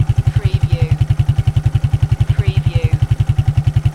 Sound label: Quad Bike Idle Loop On Board Perspective